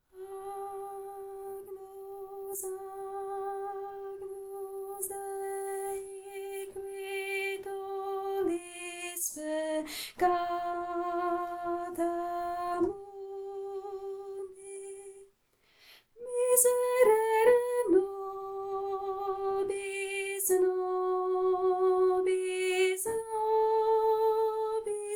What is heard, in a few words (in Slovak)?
sopran; Veľká Noc - Agnus Dei; Farnosť Letanovce